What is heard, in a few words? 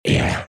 Monster Grunt